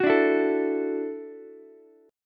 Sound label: Gb Minor 7th Sharp 5th, Ukulele; strummed; JGuitar